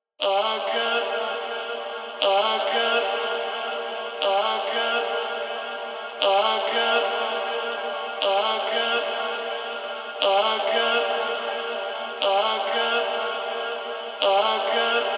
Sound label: Bass 120bpm G house retro